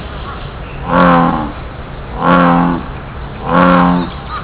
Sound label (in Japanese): ushigaeru; KitamotoShizenKouen